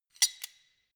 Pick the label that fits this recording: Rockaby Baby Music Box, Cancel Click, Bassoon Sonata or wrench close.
wrench close